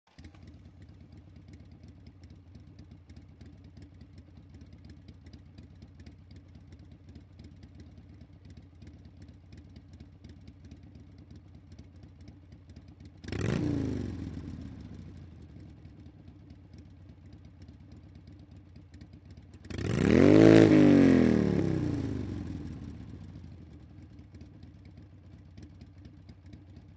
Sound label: Motorcycle starts, regassing, jamming